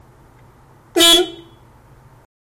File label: Horn